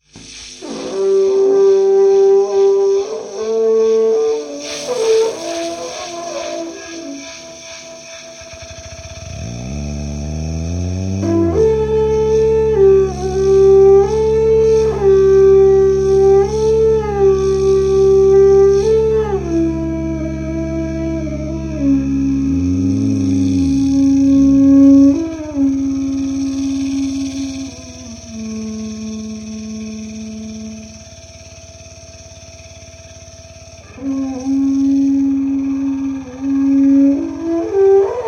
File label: Tribal Music